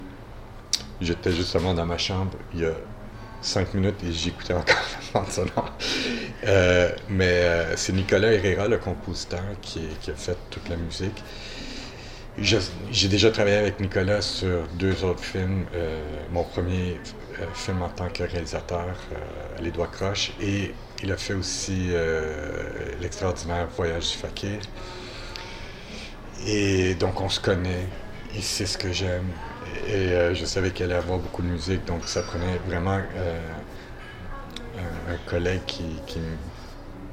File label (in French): musique